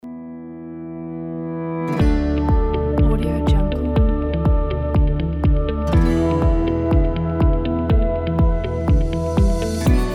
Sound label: bright ambient background